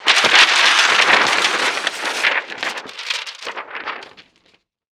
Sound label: paper fall; Call of Duty Modern Warfare